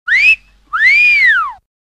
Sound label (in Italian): fischio divertenti